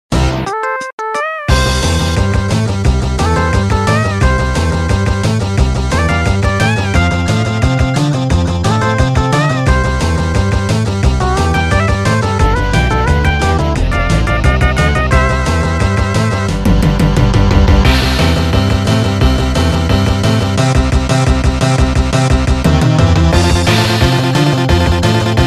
Rock and roll

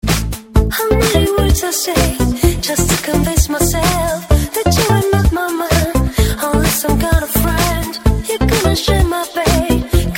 Falling

